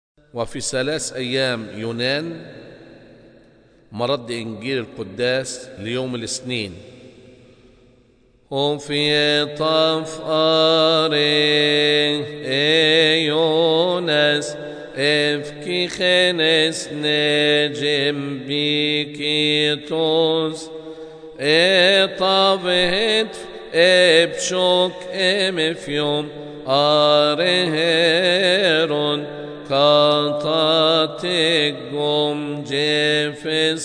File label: Gospel Response; Gospel Response of Jonah's Fast Monday Liturgy